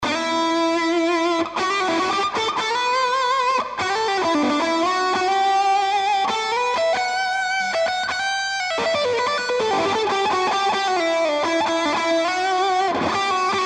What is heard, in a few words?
solo plate no delay